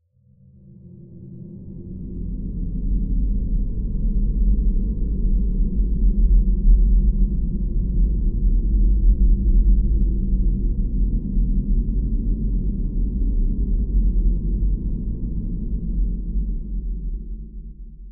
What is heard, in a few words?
buzz drone; The Swapper